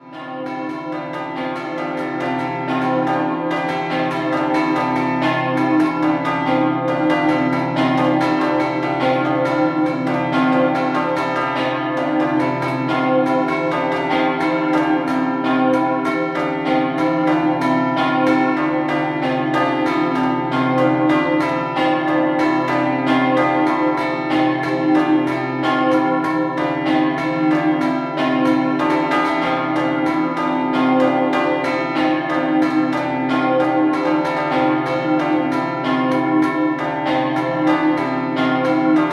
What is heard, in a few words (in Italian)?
MONTENARS Sant Elena, campane